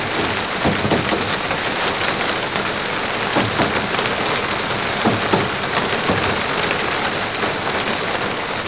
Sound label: rain on metal